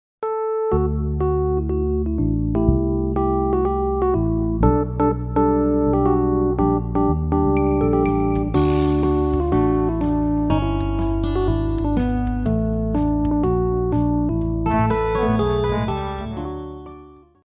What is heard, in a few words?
Instrumental